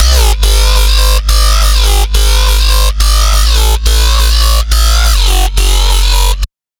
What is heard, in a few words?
Bassline C3 140, Dubstep; GhostHack